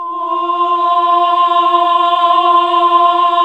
HAL AAH, Nuns Aahhh; Sound & Vision - Gigapack II CD 1 (Roland); HAL NUNS AAH OOH

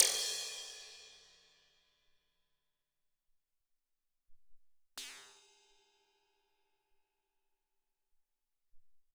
FX Crash, Alesis SR-18

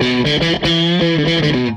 Tele Guitar Loop

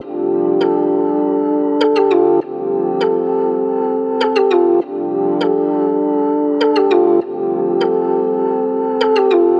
100 D Synth Midnight; Zero-G; Future Wave

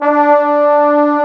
BONES, Sweet Brass; E-MU Producer Series Vol. 2 – More Studio Essentials; Composer

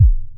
TR kit, techno kit; Pro-Rec - Synthsonic

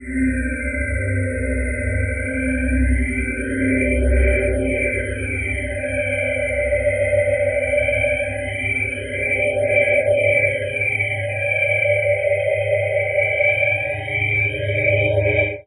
Swirl Cloud C, Atmospheres; Zero-G Ambiosis

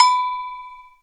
agogo, Yamaha TG-500

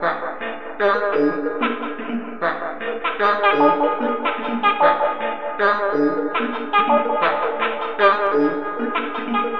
Frog Children, Vocal; Sonic Foundry (Sony Creative Software); Myths of Technology

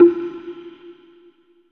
HIT NOISE; AKAI S-Series CD-ROM Sound Library VOL-5

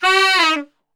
G 2 SAX SHF, Sax; Giga Samples Collection

